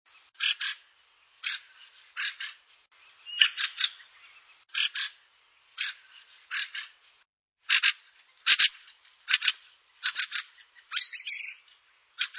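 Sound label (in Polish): kwiczoł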